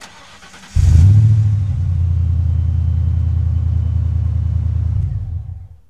Truck Explorer Start Off; Trucks

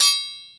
Sword; Blind Quest - The Frost Demon

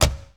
Porsche 911T Door Close; Automobiles

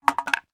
Ping Pong Paddle Placed on Table; Miscellaneous; 1001 Sound Effects

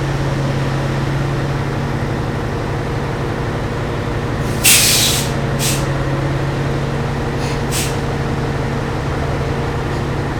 bus drive, street traffic; best service Blue Box

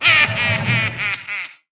Lane Switch Middle; Trickster; AudioWizards

